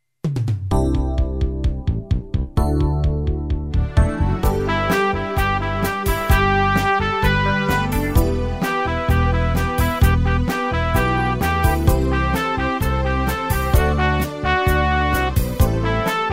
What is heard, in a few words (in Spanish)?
Pista con melodia instrumental